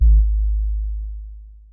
bass boom

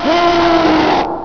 alert

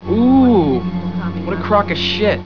ooh

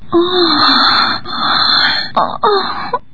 ohhh

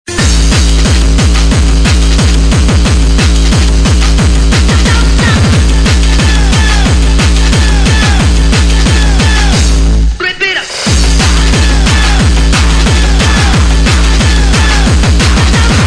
bass powa

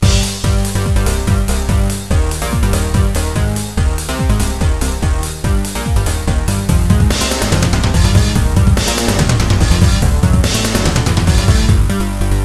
zelda techno

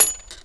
shell

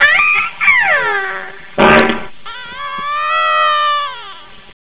baby fart

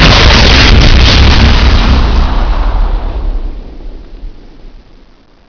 ion fire

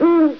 owl

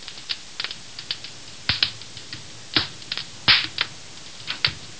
fire

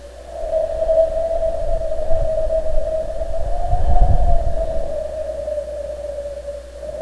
errie wind, ambience mountain hamlet

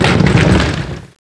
bust concrete

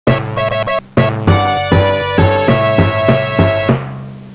tadae

